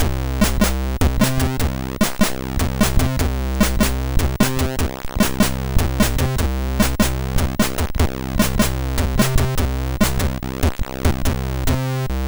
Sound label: sid, MOS6581R4; Trogue64 Preview